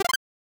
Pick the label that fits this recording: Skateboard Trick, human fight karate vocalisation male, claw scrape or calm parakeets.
Skateboard Trick